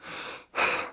sprint breathe, police

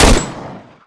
m16 fire

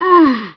zombie pain, male

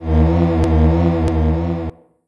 gauss fidget, weapons

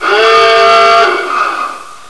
klaxon

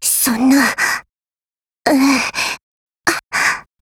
hk416 bar pull

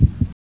hearbeat

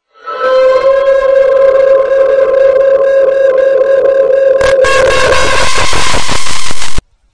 oof

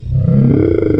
octabrain alert; dn3d r

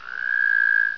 croak medium

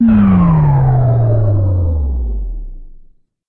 forcefield off, ambience

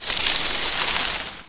bush

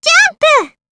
jump

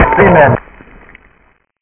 body splat

